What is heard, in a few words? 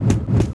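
Speed Step, Movement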